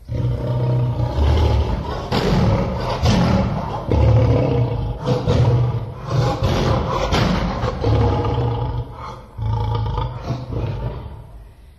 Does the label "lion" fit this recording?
Yes